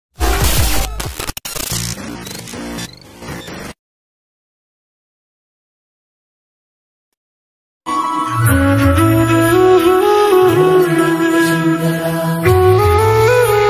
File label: instrumental ringtone, mahadev ringtone